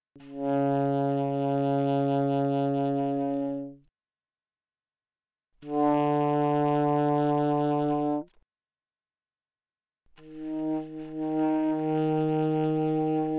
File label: Alto Sax Vib pp Db3 B3, alto saxophone